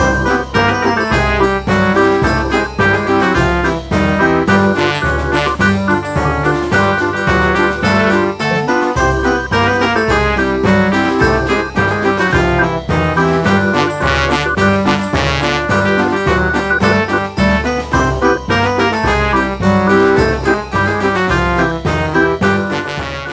Circus Music Theme Ringtone

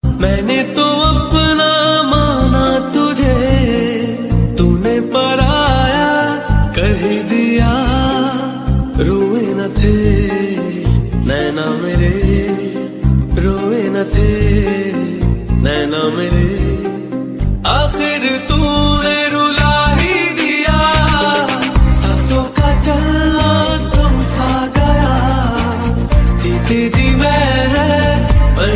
Breakup Song Ringtone

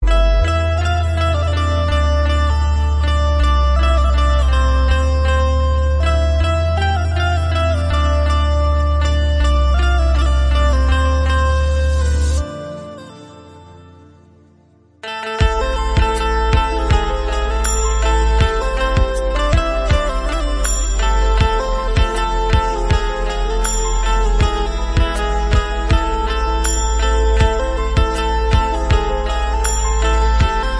Instrumental Version Ringtone

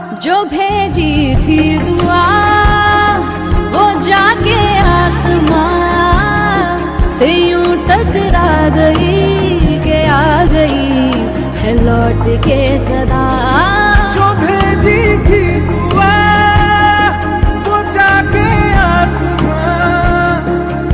Female Version Ringtone

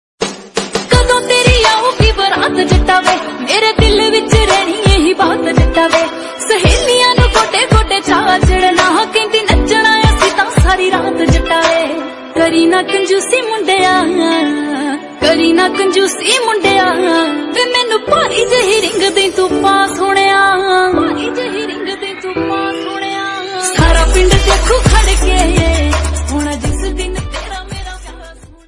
Female Ringtone

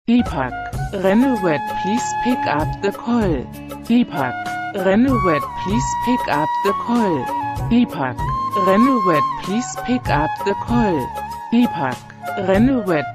Name Ringtone with Song

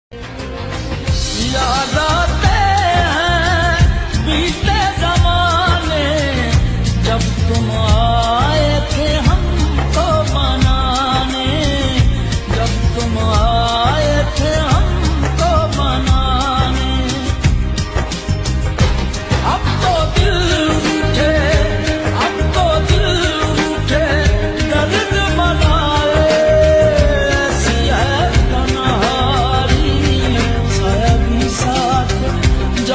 Sad Song Ringtone

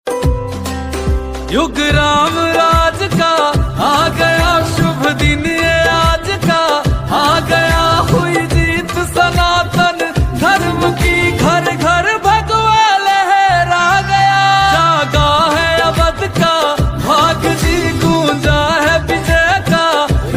Bhajan Song Ringtone

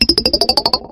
Anime Notification; ringtones

